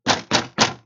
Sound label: Banging